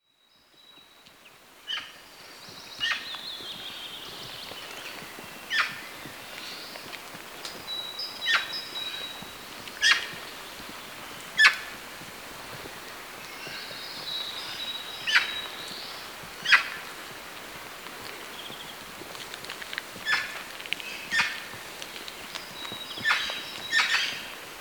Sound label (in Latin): Pica pica; SETE